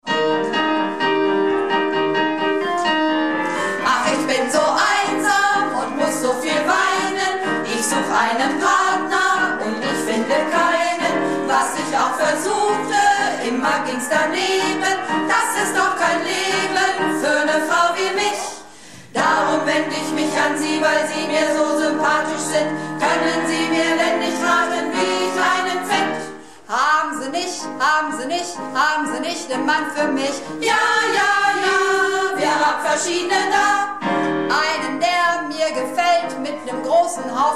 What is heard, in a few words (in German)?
Sopran, Probe